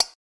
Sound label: arrow impact metal; 0ad; attack